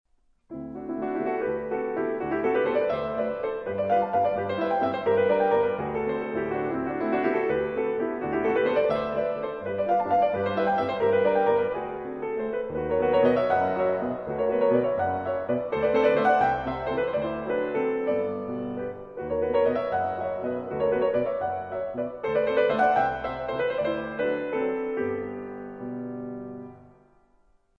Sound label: waltz